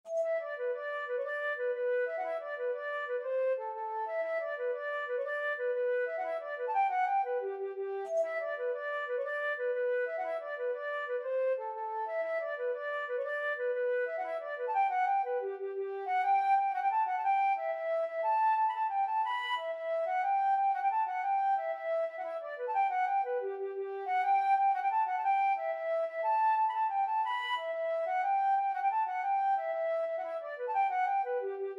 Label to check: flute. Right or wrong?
Right